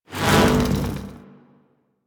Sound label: Hoverboard Slide Start; Warframe; Vehicles; Venus; anim sounds